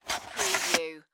VHS Cover Insert

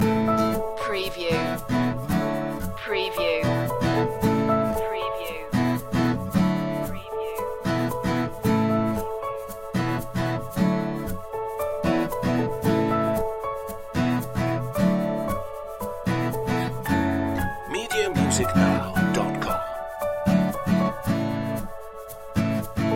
loop

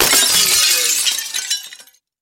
Glass Break Large

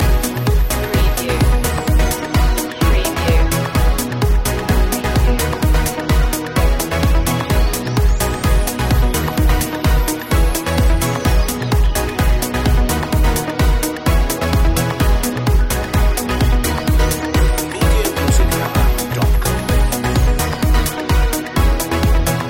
night train loop

